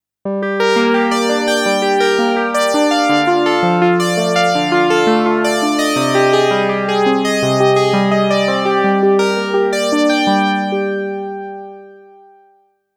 OB-X8 Vintage

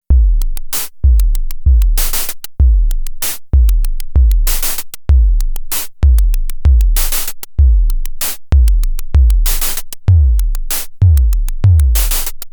twincussion softer kick

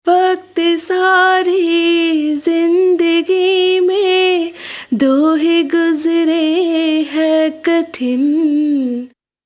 Vocals DBX

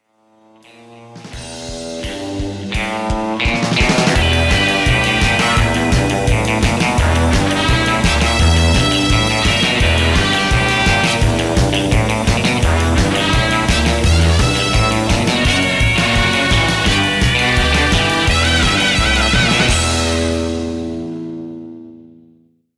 Trumpet Surf

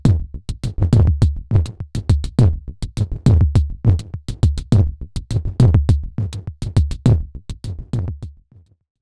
Loop

